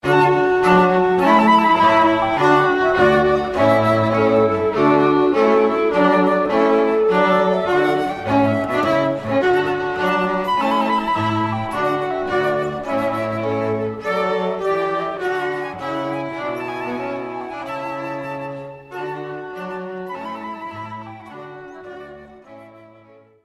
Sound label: flute & strings; Purcell